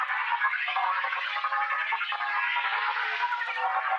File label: Tonal Re Draw, sparse soundscape